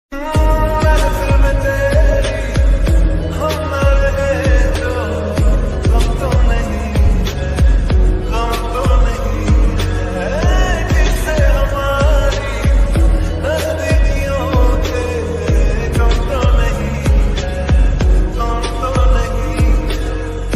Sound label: attitude ringtone english ringtone